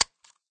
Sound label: stapler